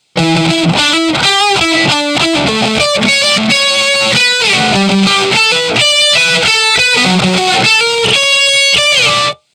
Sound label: Solo Guitar; GR2 Workshop